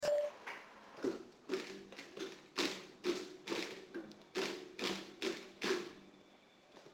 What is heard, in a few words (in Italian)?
chitarra